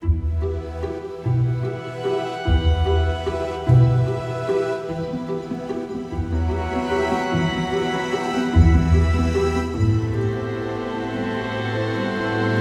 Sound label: Forest Door Opens; the last campfire